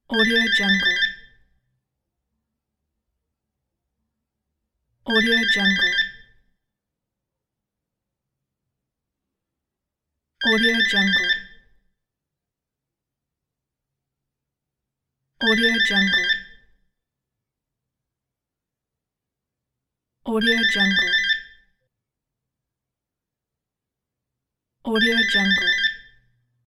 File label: telephone